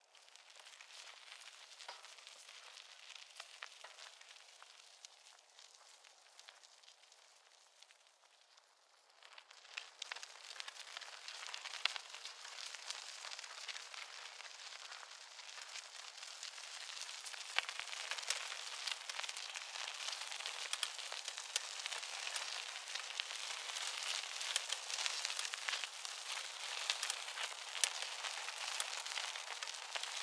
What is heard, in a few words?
Bicycle pedaling on dirt; Wav Junction Sound Effects; Bicycles